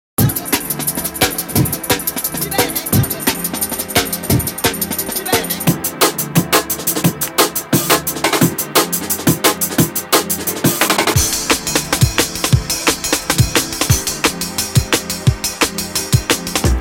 jungle breaks

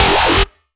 Fm Riddim Shot

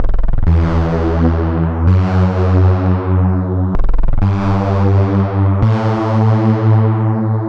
Bass Loop E 128

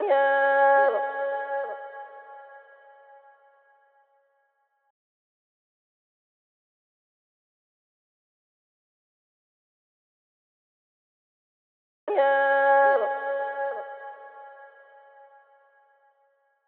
Vox Loop C-min

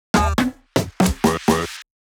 Drum Fill 125 BPM

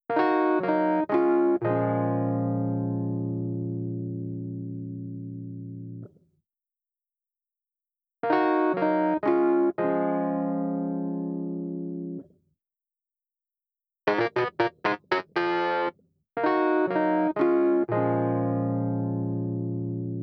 Distorted Rhodes

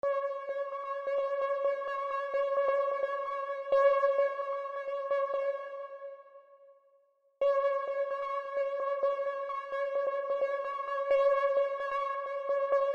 LEAD

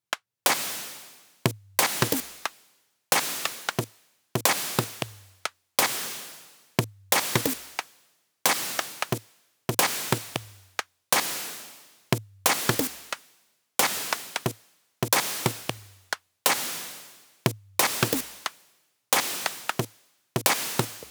dry 90 bpm percussions

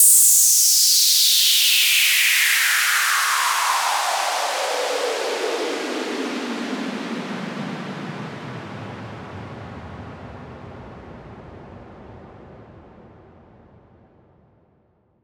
Downsweep

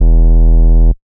808 E

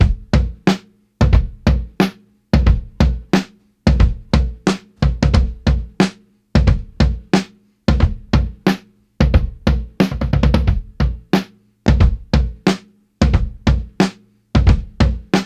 90 drum loop triplet tom taped

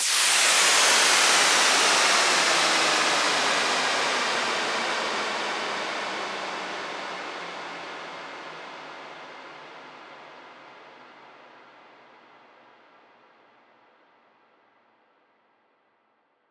Downlifter, 150bpm, 16 bars

